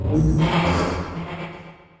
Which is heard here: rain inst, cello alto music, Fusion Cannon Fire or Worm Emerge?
Worm Emerge